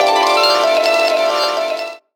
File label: HRP TRAP; Rawcutz; Nu Forms